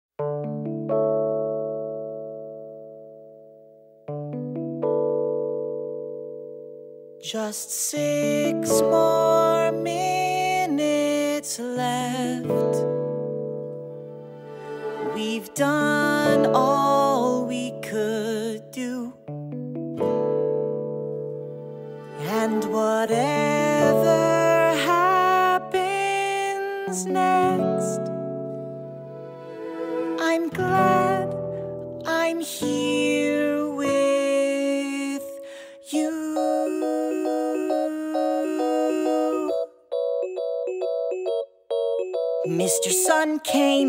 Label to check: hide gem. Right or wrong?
Wrong